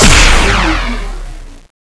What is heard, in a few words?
energy fire; mew